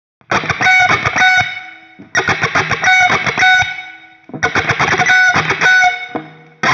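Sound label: Intro Clean, guitar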